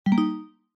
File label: private message